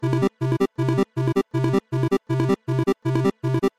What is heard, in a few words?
loading loop